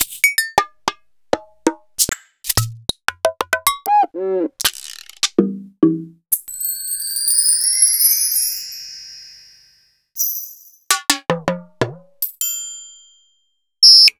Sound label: Akai MPC3000